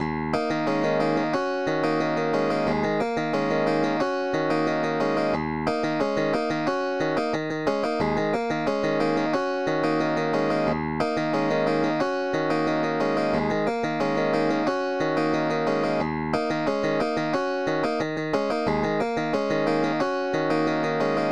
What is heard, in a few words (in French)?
Thème main droite, funk piano; Second Line 2 + 3